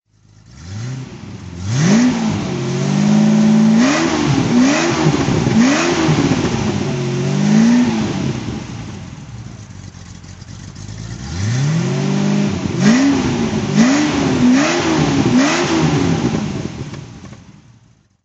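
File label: jaguar etype v12 throttle, engine sound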